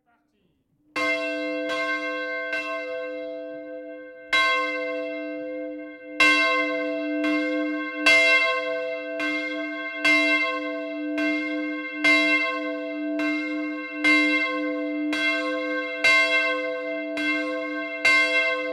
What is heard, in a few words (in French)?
Lausanne cloche